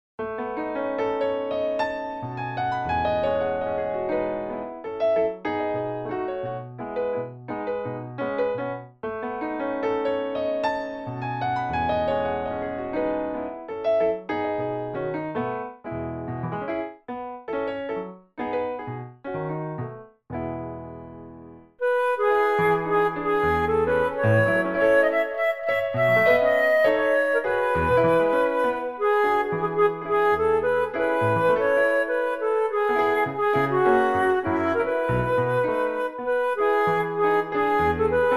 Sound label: play flute